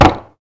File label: Catapult Launch; openclonk